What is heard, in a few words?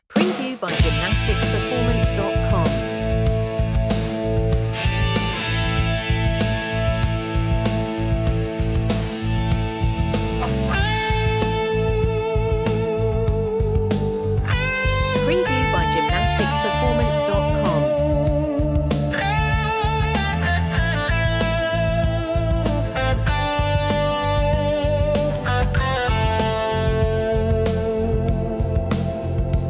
classic rock